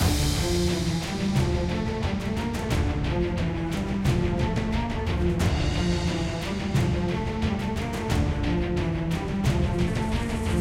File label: Timeline BGM; AFK Journey; Android